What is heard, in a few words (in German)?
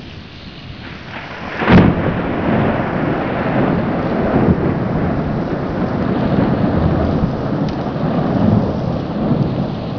blitz mittelweit